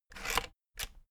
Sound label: rotary control plastic rattle turn back